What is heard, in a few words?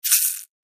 shaker toy maracas real